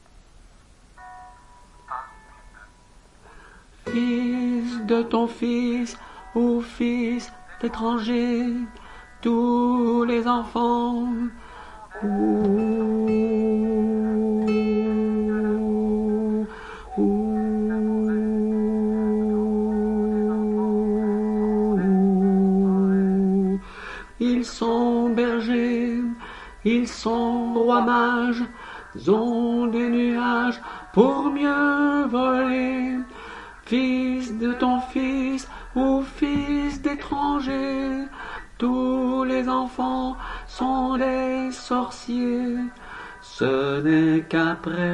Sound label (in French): hommes, chorale